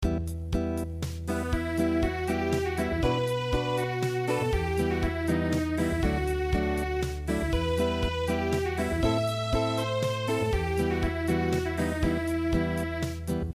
Unplugged; Ich hab die Nacht getraeumet

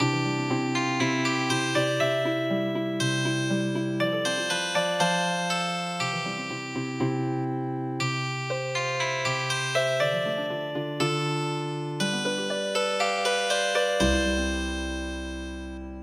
Healing Harp

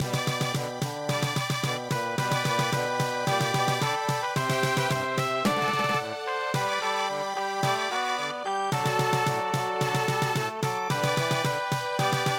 brass band march, midi; My Old Kentucky Home

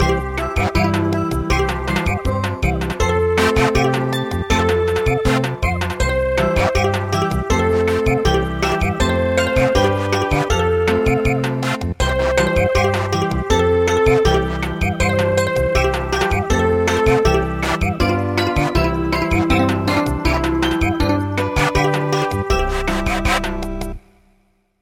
Samba; traditional; KewpieSan

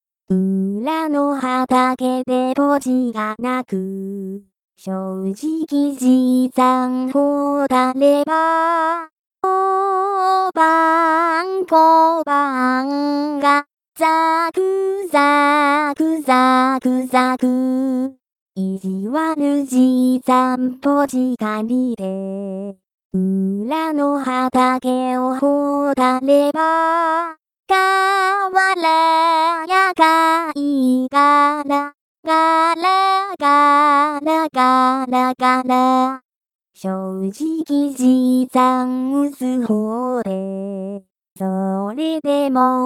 Hanasaka vocal only

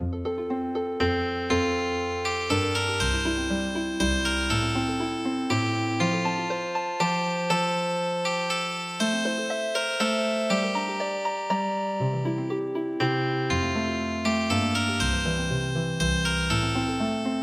Healing Harp; Da droben auf jenem Berge

